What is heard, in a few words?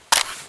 scout clip out